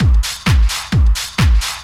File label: groove, DRUMLOOPS